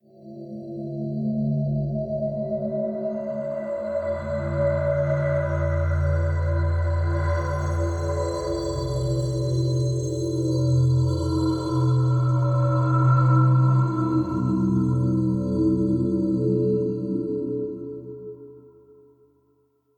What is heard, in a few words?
Field Jingle; Earth Seeker; Wii